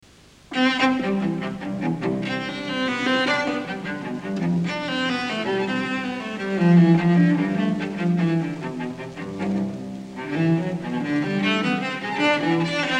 Suite No. 3 in C BWV 1009 III. Courante. Allegro non troppo; J.S. Bach